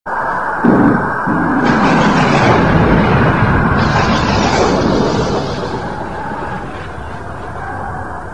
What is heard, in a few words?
Before Rain Sound